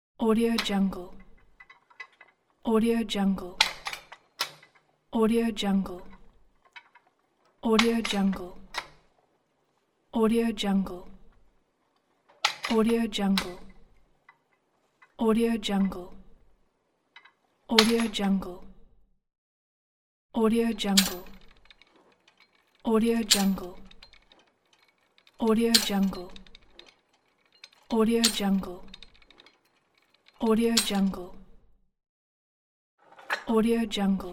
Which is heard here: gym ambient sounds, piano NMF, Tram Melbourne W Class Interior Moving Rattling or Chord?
gym ambient sounds